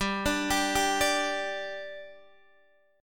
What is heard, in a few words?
G-5th-G-x,10,12,12,10,12 down Guitar DADGAD